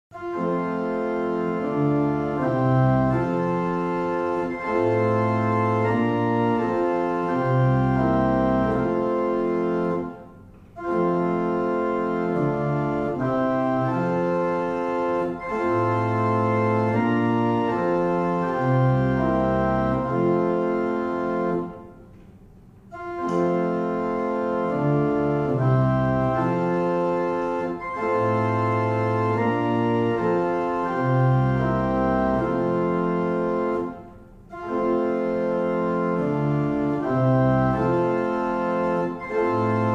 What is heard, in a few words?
Single chant, barrel organ; Easton